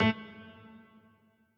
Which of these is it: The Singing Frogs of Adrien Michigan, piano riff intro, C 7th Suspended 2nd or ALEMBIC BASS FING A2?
piano riff intro